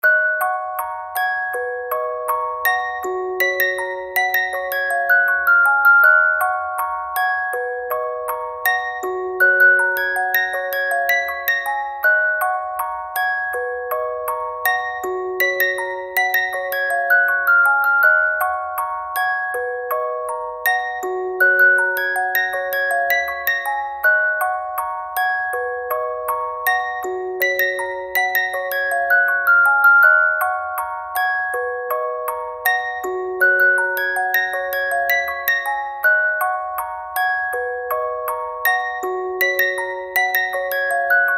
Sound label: music box; anime yowamushi pedal op